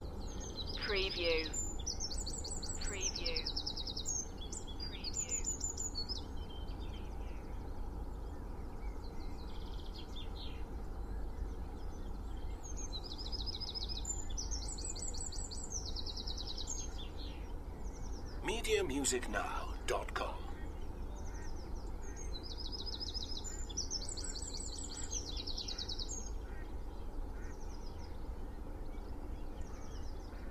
Spring dawn chorus with distant traffic noise Cheshire UK; beanstalk audio